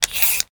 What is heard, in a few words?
scrape bamboo